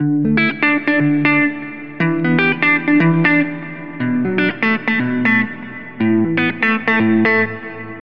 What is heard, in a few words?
Dream state guitar